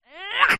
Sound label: cartoon small character high pitched voice fight vocalisation